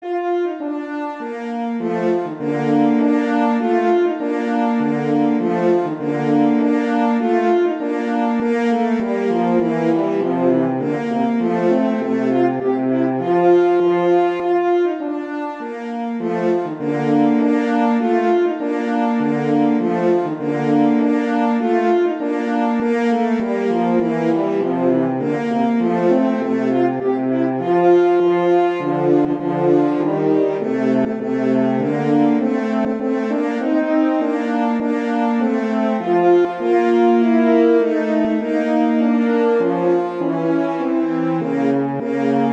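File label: Duet, horn; Mozart; Duets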